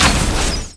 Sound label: Raining Arrow; Elf